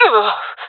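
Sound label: death, jungle female; vo; agents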